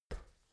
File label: step ladder metal single